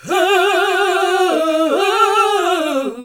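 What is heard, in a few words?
Gospel; Best Service Gigapack 1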